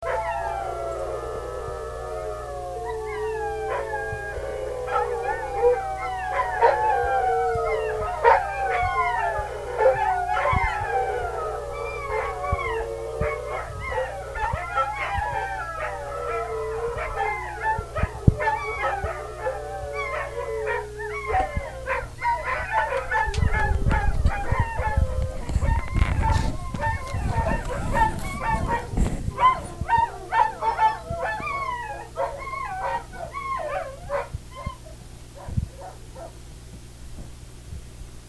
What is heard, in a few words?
pack howling, wolfdog